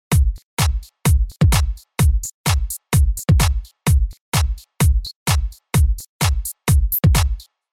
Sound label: house nomix